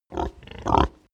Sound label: pig grunt breath